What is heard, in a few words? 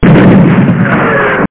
tank Fire; TacOps Demo